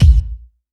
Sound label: Yamaha CS1x Drums